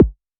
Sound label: Multi-Kick Short